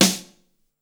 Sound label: 00s long room reverb steel snare drum sample g key